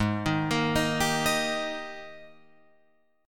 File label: Ab Major 7th Suspended 2nd Ab Guitar Open F; AbM7sus2; 8,10,10,10,10,10; arpeggiated; chordshape; JGuitar